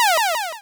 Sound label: Cry